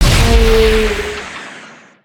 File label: howl; creatures organic